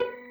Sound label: crystal cathedral 100bpm stab; algorave